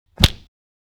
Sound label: brutal hit